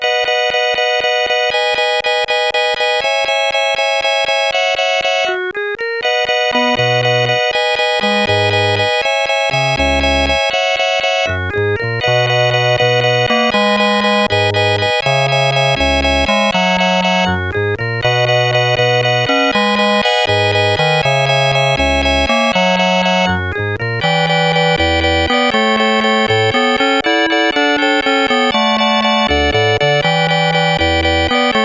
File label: fifties song; The Music Studio